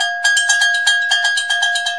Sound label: 121 hard latin bell